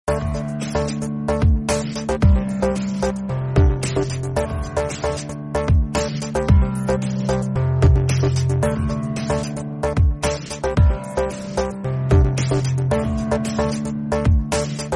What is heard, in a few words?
samsung interstellar